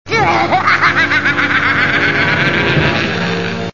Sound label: hahaha